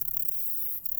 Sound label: song, katydids; neduba; inversa